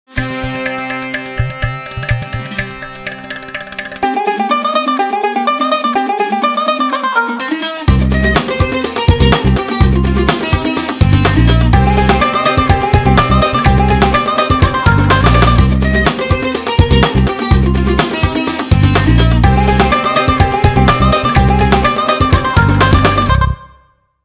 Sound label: Groove digger; PlusFourSix; Paul Bergman